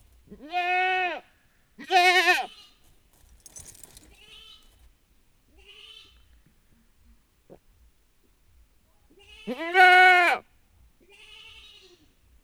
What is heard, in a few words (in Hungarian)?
kecske gida és a láncon levő mamája; állathangok; paraszt udvar; házi kecske